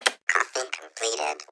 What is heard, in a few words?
coffee completed